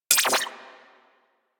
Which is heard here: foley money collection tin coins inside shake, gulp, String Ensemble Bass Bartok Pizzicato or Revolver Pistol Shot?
Revolver Pistol Shot